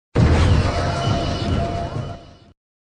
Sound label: lock; drmatt; tardis